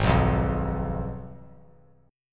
Ab Minor 6th Ab, down, 3C, 3F, 3A, 3D; Abm6 strummed; JGuitar

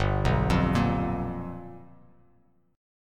G 7th Sharp 9th G chord; G7#9 arpeggiated; JGuitar

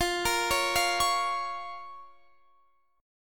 F Suspended 4th Sharp 5th F, Guitar Standard; Fsus4#5 arpeggiated; x,20,20,18,18,21; JGuitar

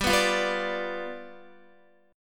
G 7th G down Guitar; G7 strummed; JGuitar

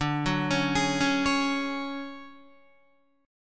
D Major Sharp 11th, down Guitar; DM#11 arpeggiated

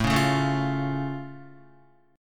A Augmented A down Guitar; strummed; JGuitar

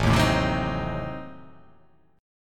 A Diminished 7th A down

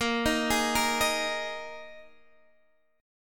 A sharp 7th Suspended 4th A sharp, Guitar, Drop D

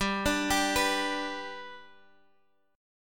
G Major down Guitar Half Step Down

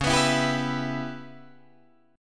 Db Minor 7th Flat 5th Db down Guitar Drop D

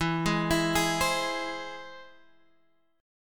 E Augmented; arpeggiated; 12,11,14,13,13,x

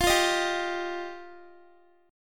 F 7th Suspended 2nd F down Guitar; F7sus2; strummed

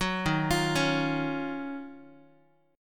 Eb Minor 9th, Guitar; arpeggiated; chord; 9,11,8,11,x,x; JGuitar

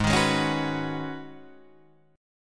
B 6th Add 9th G sharp, guitar chord

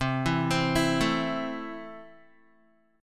C 6th, Guitar; arpeggiated; 8,7,10,9,9,x

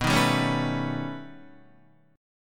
B Augmented 9th B Guitar, chord; strummed; JGuitar

